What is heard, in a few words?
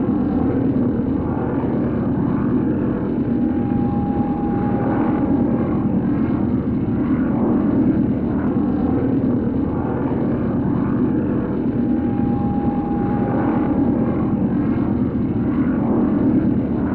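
ship interior ambience; millenium falcon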